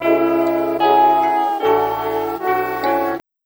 loop 150 BPM; 4am

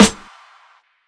snare

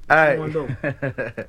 AY + LAUGH; Vox

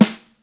snare, kits

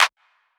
Clap (Clean-Mix)

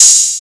Open Hat

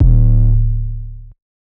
808 clean Eb; 808s

